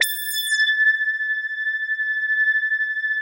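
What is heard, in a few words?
OBERHM OBXA, Oberheim OB-Xa; Big Fish Audio - First Call Vintage Keyboards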